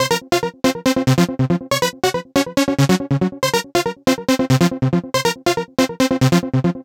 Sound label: NRG Arp, trance